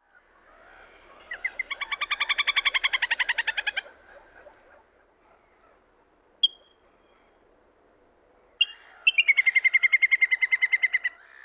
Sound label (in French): grèbe castagneux; sonotheque ornithologie